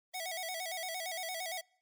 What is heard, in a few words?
phone call; 電話コール1